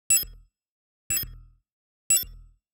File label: ui Scroll; Kpow Sounds; UI SOUNDPACKS; GDC Game Audio Bundle